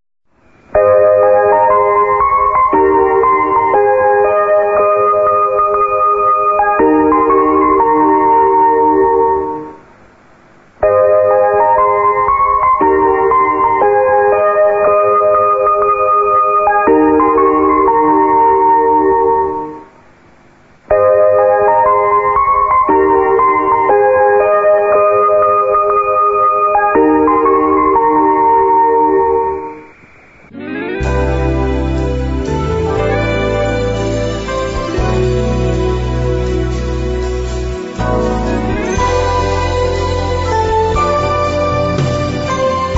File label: romanian, interval signal; mco; twr